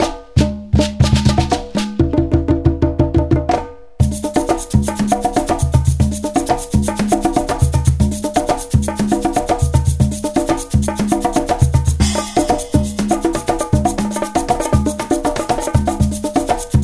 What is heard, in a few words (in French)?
Tamtam joie